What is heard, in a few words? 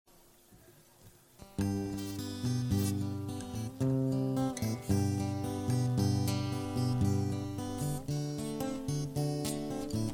songs